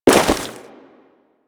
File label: Step Ice, Footsteps; Enemies; Crewman; Warframe; Corpus